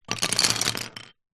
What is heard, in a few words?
office pens pencils handful set down table; zapsplat